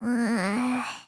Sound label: nyeehh; himiko